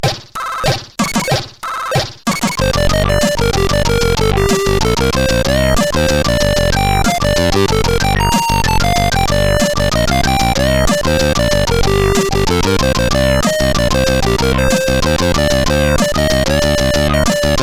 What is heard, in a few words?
Tecnetium, CSG8580R5